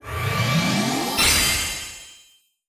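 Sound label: Card Level Up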